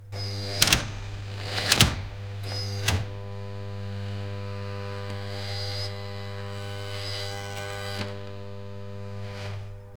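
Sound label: sound of industrial robot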